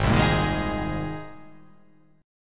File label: Ab Major Add 9th, Abadd9 chord; Abadd9 strummed; JGuitar